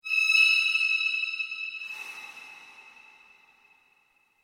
Scream Flashback Vocal Flash Scary Haunted; Flashback Vocal Scream Flash Scary Haunted Piercing; Horror Transition; Evil Voices

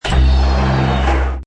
Hi Tech Panel Servo Button

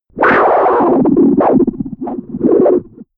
Chaotic Electronic Wind, whoosh; Whoosh Sound Effects

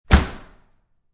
Door Close, Metal Door; Household; Heavy

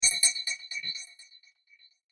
Laser Metal Echoes, science fiction sound effect; Laser Sound Sound Effects

